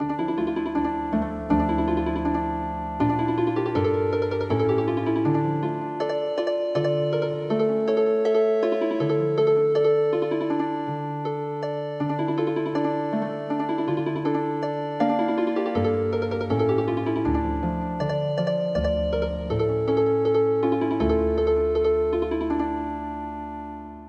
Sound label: Healing Harp